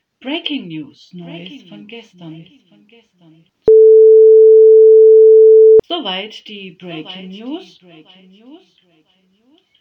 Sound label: Breaking News